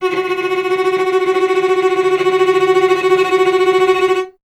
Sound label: STR VC F#2, Cello; Miroslav Vitous; Solo Instruments VOL-1